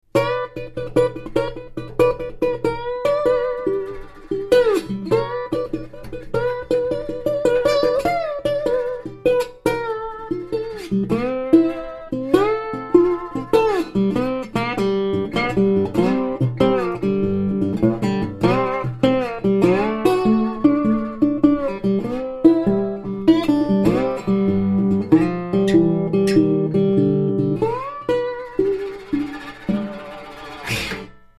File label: dobro